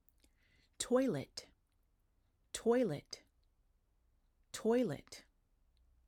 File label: toilet